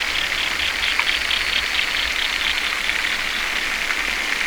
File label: crickets